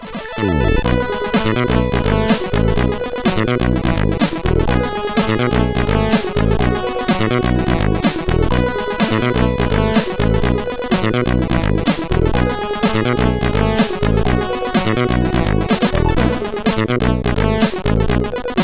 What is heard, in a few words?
Boogie Factor Intro, CSG8580R5; sid